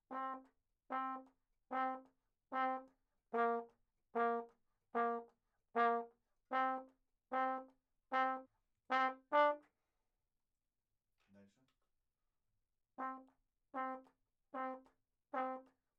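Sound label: RAGTIME trombone 2 room L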